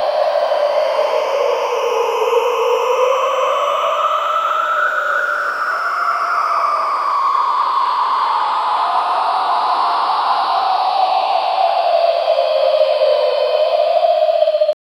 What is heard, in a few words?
noise of wings when angel